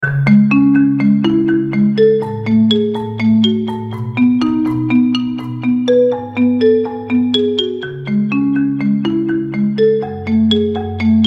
Relax Sound

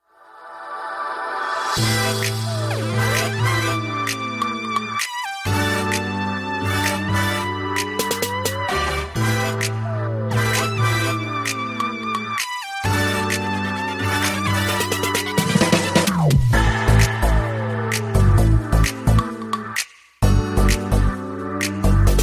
Instrumental

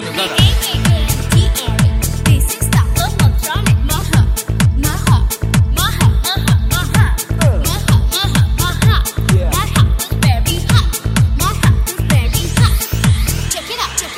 Mantra-maha Maha

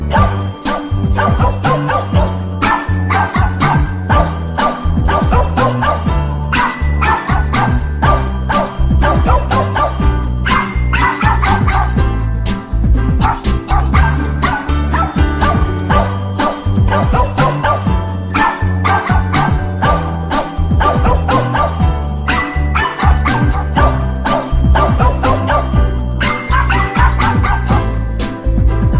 Doggie Bark Song

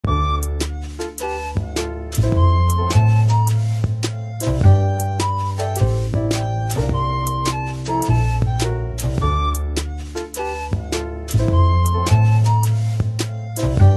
flute part

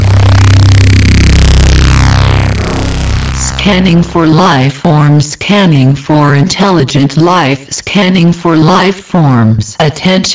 Female tricorder

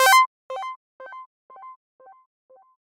Arp Notification

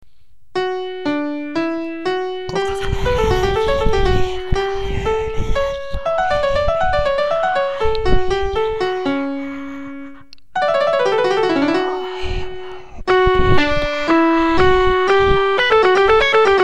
piano vs guitar solo

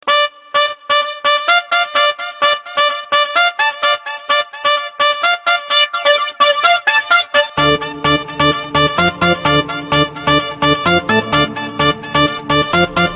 Trompet

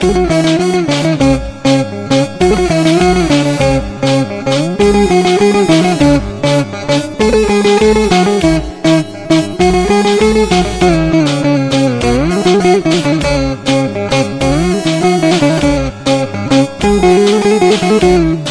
Turkish Saz Rumba

